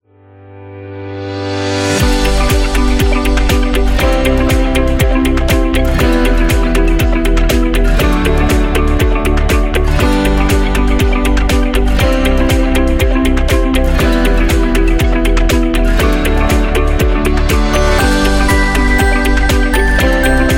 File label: Medical Upbeat